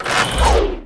Crane attack

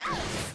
Ice Range HIT

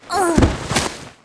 Raccoon Die, game; Eft; Raccoon dog

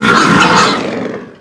Cow Die

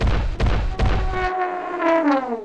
new elephant move; Eft

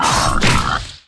Pig die; Eft; Dung Pig

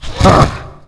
GOBLIN attack; Eft

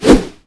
Axe; Weapon

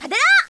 Heroine Attack

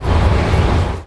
Shark attack; Eft

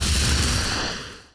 magic fireball hit

